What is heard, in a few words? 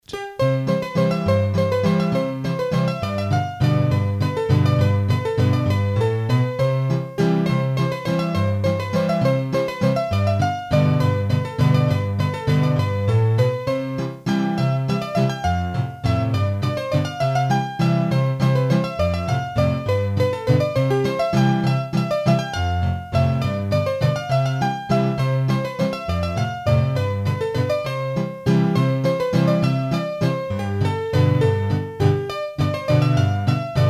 Brezon Valse, hurdy-gurdy